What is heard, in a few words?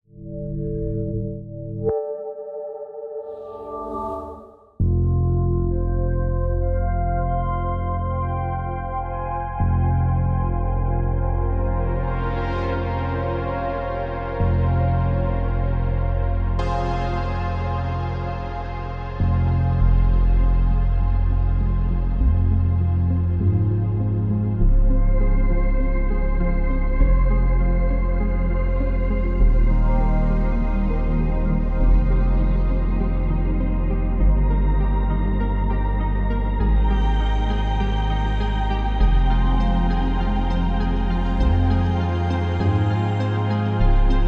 pads; echo system; golden screw studio